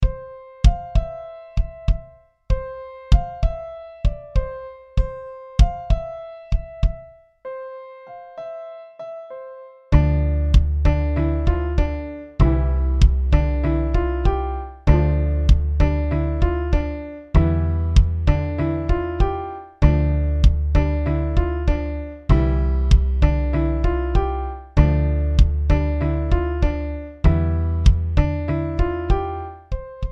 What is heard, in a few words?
Melody Task